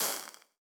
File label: Liquids water lava